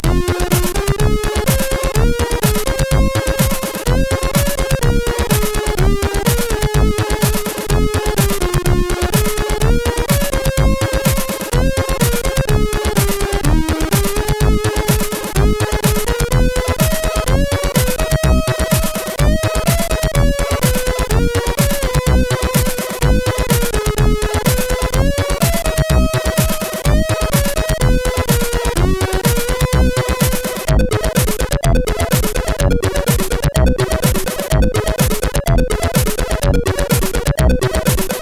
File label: music, sid, CSG8580R5